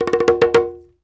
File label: BRKDR, Reggae; Spectrasonics - Supreme Beats - World Dance